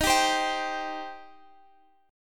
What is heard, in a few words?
C Minor Eb